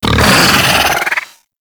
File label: Pet Vocal Angry; Warframe; Alad V Pet